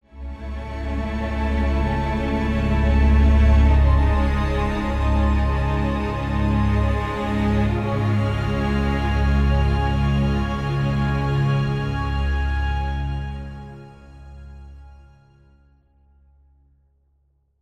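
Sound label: Music Transition Short End Only; from dust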